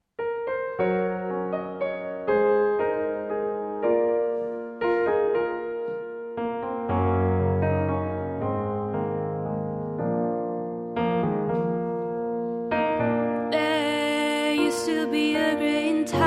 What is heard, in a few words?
acoustic